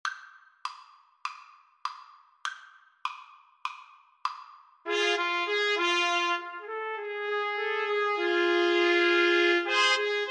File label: TPT, Trumpet Trio